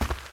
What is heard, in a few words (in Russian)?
korotkie nepriyatnie chastie pomehi v televizore